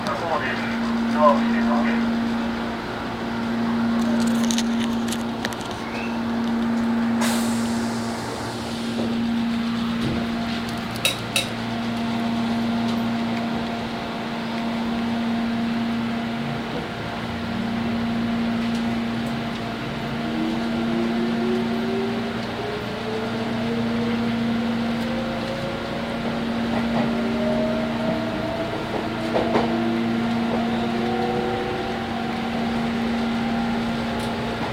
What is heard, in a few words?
meitetsu 5300, train motor sound